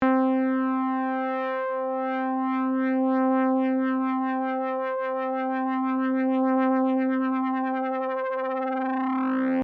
LFO

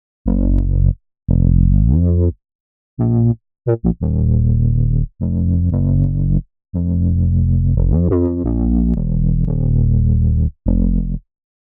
Synth Bass

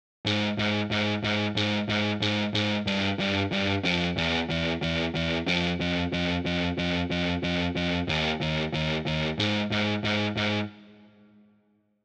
Guitar

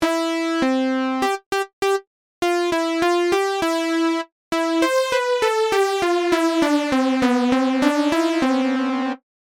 Chorus

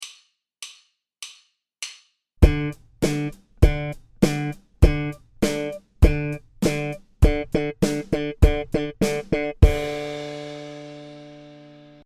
pull, bass

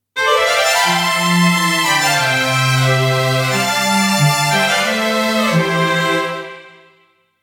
strings solo

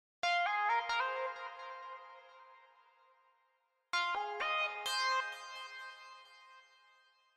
Synth

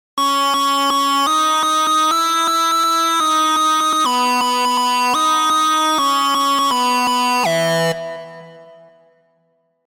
Synth Lead

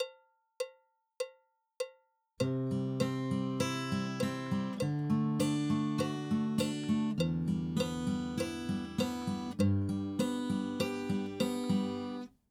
chord Arpeggio; guitar

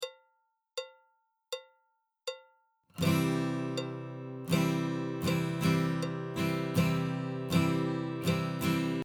stroke; guitar

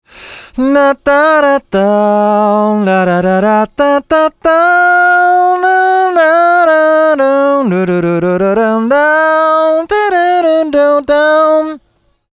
Vocorder

